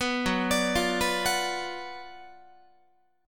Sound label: F sharp Major 7th Suspended 4th Sharp 5th, F sharp, down, Guitar; F#M7sus4#5 Chord; arpeggiated